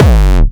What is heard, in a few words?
kick distort